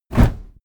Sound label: cardboardbox soft